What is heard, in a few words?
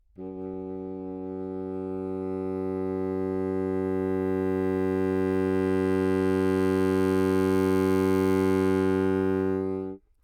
low, anechoic classical bassoon; OPENAIR